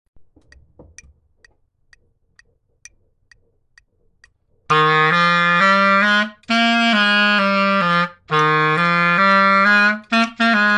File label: Bass Boogie Bassline 130BPM